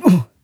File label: Little Fighter Guy - Vocalizations - Crash Bump Fall